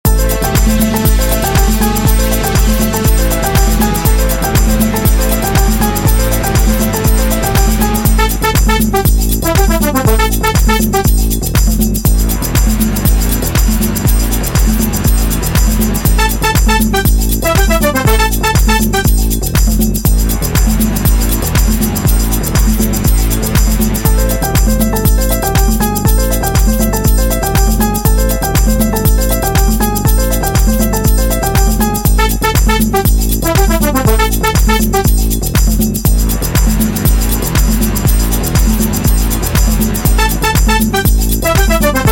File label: salsa